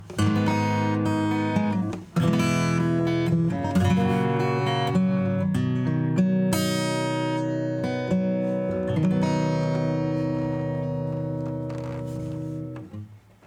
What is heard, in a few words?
Over Compressed Acoustic Guitar, acoustic preset; Pulsar